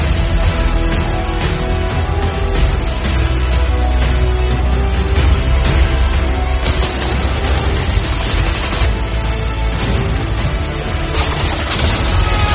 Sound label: Movie BGM